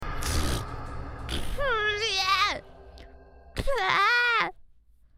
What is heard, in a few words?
baby rage noises